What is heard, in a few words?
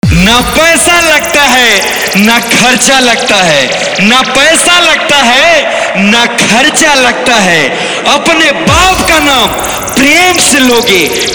Hindi Remix Mp3 Song